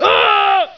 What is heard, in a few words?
scream male short; ooh ahh